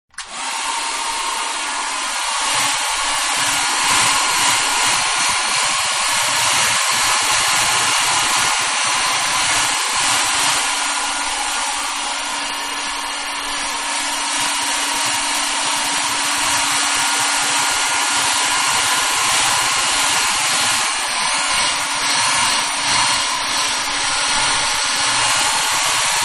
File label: Hair Dryer-High; Appliances; Household; Manhattan Production Music